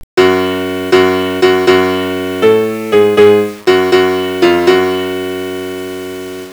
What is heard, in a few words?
horror piano